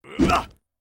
Character Black Attack; Angry Birds Epic

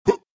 Jump Bear

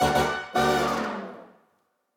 Victim Reveal; Flash Point Fire Rescue

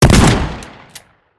shotgun

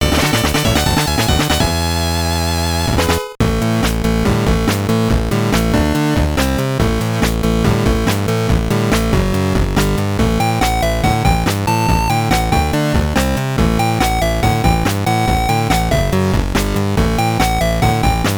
Victory!; Rockman EXE N1 Battle; WonderSwan Color

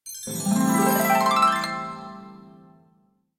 Level Good; mahjong royal towers ios macos ps vita ps4 windows gamerip 2013

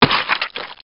pod die; Blood: Fresh Supply

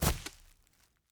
foliage land

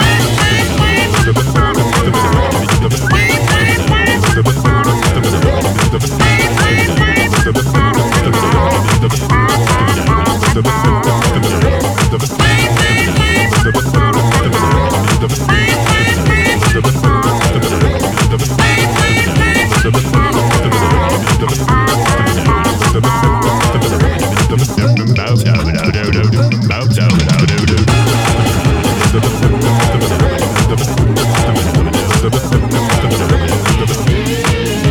Music solar Race Loop

